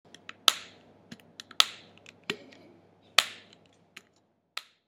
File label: unlocking the door